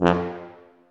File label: TUBA C1, Solo Brass, stacc; Roland LCDP12